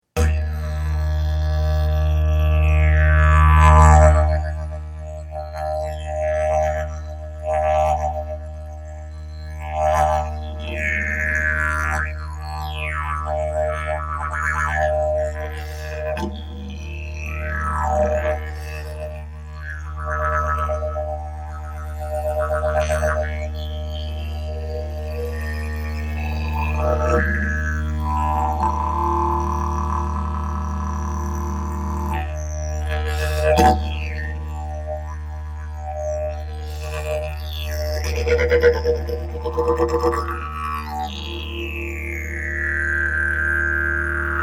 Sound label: didgeridoo